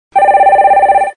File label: MIDI; Wiko; K16